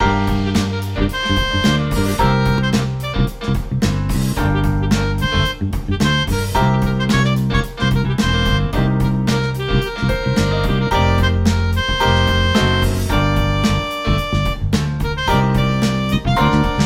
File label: Funk Marching